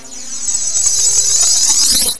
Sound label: CRYSTAL; HIGHTECH